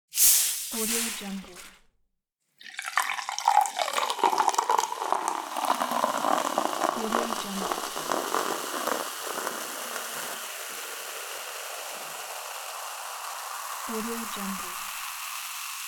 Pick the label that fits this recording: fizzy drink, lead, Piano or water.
fizzy drink